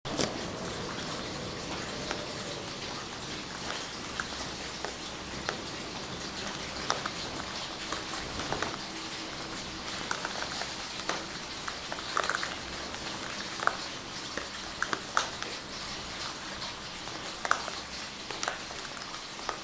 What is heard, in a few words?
rain pipes morning